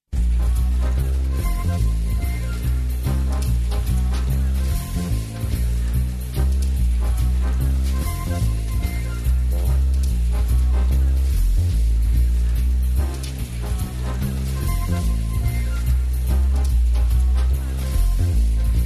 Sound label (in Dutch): Radiocafe tune; 1992 AVRO